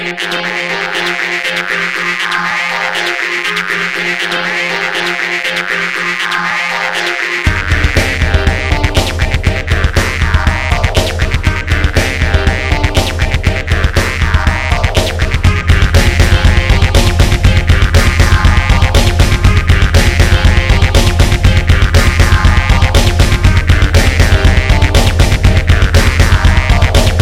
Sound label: deepest drum